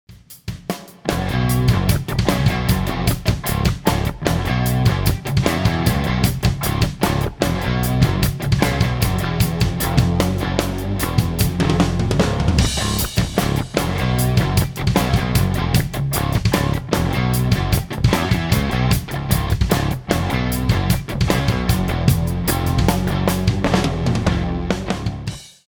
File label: funky